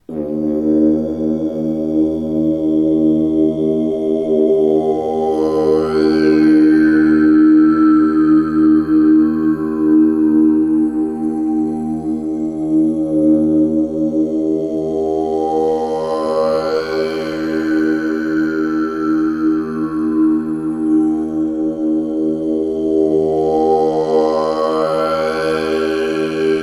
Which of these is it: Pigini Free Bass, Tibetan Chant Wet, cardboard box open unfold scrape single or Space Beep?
Tibetan Chant Wet